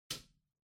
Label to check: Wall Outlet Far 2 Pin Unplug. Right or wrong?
Right